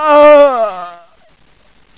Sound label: die; kurtz